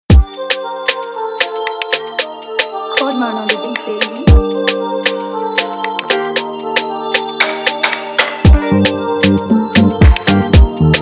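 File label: Afrodance, Afrobeat instrumental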